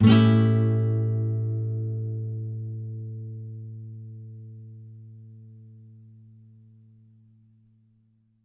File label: Nylon Chord A7, Guitar; Nylon Guitar Chords